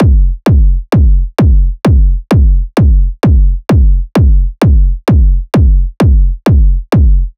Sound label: Kick Loop 130, Techno; Ghosthack; Free Techno Sounds 2024